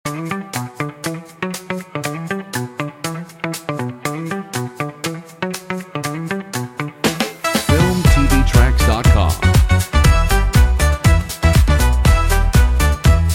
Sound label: Mambo groove; Funky